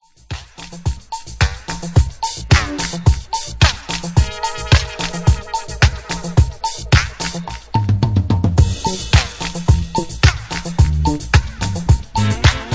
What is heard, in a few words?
dub